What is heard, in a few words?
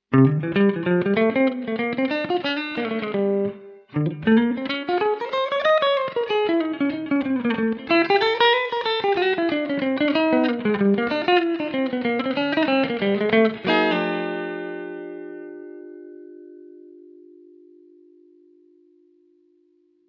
Just Reverb